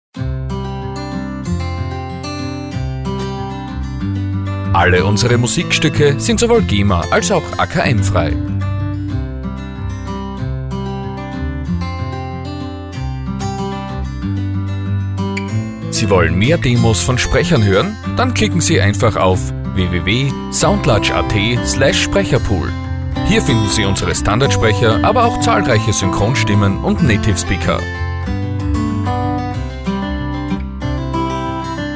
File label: Slow